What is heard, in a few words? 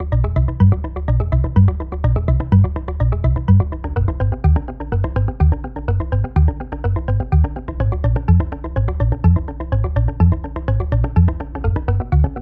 Minimal Shine 125bpm